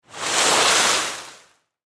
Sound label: Sports Ice Skates Scrape Stop; Ice Skating